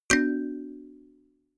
notification kalimba triad minor low

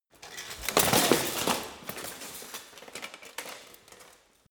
bodydrop

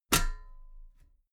metal ladder step regular

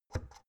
oven knob turn fast hard

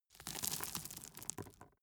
gravel drop

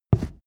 step wood step

